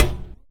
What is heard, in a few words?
impact Plate heavy